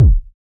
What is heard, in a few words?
Kick; Samplepack Produtique